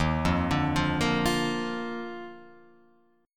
Eb Major 7th Suspended 2nd Eb Guitar Open F; arpeggiated; EbM7sus2

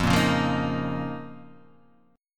F 7th Suspended 4th Sharp 5th F; strummed; chord; F7sus4#5; x,x,3,3,1,0,0,0; JGuitar

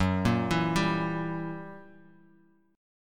Gb 7th Flat 9th, Bass Standard; arpeggiated; JGuitar

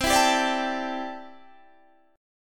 C 6th Add 9th C down Guitar Standard

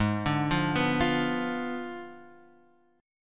G sharp Diminished 7th G sharp, Guitar Open F; G#dim7 arpeggiated; JGuitar

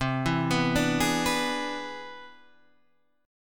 C Augmented Major 9th, Guitar, Half Step Down

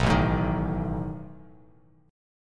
A Suspended 2nd Flat 5th A down Bass Standard; Asus2b5 strummed

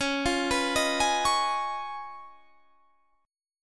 Db 9th Db down Guitar Standard; arpeggiated; JGuitar

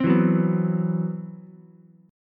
C Major 7th Flat 5th, Ukulele, chord; CM7b5 strummed; JGuitar

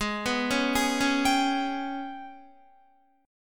Ab Minor Major 7th Double Flat 5th Ab, Guitar; Listen to AbmM7bb5 arpeggiated; JGuitar

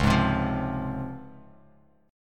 Db Major 7th Db down; strummed; JGuitar

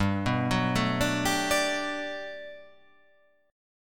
G Major 9th, G, down

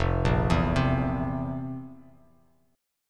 F Major 7th Suspended 4th F, Bass Standard; FM7sus4 arpeggiated; JGuitar

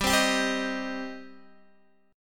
G Suspended 4th Sharp 5th, Guitar, DADGAD

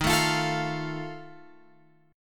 Eb Suspended 2nd Flat 5th Eb down Guitar Open F; Ebsus2b5 strummed; JGuitar

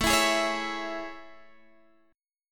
A Major 7th A, Guitar, Standard; AM7 strummed; x,12,14,13,14,12; JGuitar

